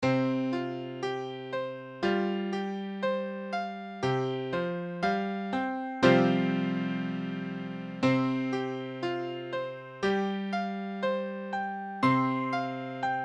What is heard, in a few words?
Csus4, piano